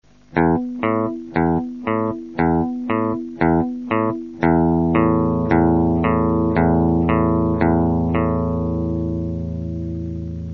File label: ear tune